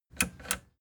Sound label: plastic button latch click disable slow